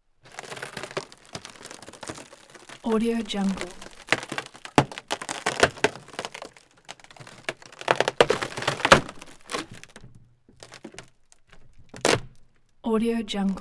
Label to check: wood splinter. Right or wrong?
Right